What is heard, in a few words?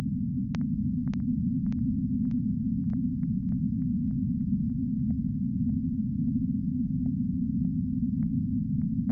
Underwater